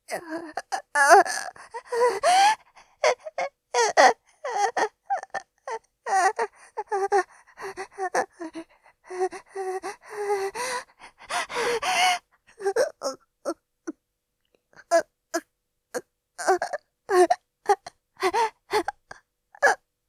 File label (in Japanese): 喘ぎ+中出しされて・息も絶え絶え; ジト目で爆乳JKうさぎっこ 首輪ちゃんのわけありや 初接客; 喘ぎスパイス！